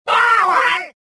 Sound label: pain, rodian